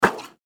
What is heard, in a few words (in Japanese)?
水ヨーヨー